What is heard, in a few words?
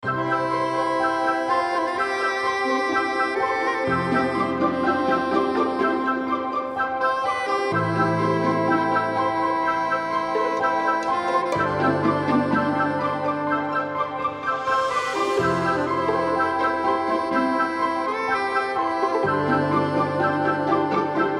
enchanted forest romantic comedy